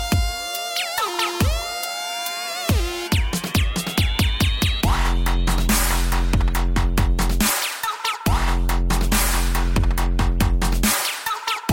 dubstep

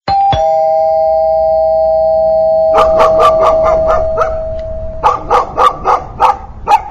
doorbell barking

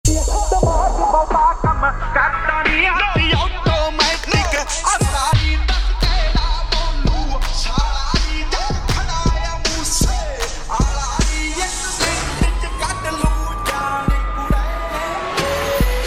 background

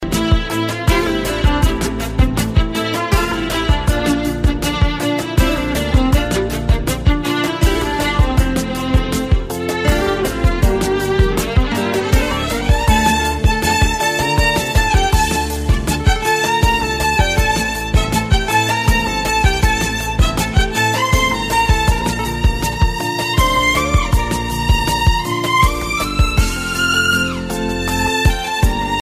amazing arabic

